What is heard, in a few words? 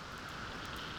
Background Noise